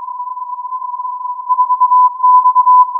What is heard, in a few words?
filtered input